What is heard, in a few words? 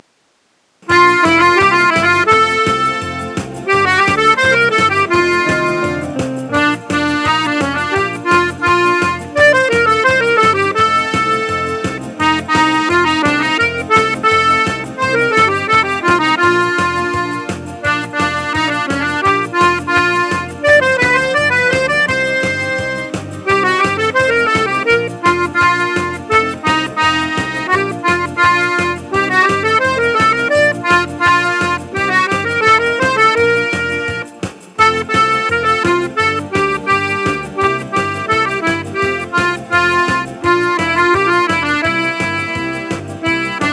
polka